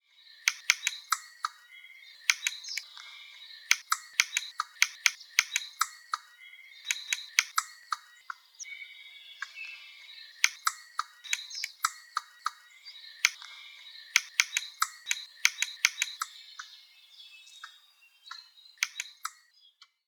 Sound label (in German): Eichhoernchen Sciurus vulgaris